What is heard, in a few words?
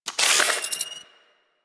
Igniter Pistol Reload; Warframe; Weapons; Corpus